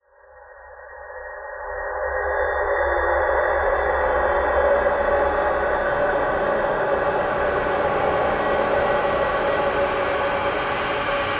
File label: Whoosh airy whistling swells into bass with high whistles grows slowly then; fades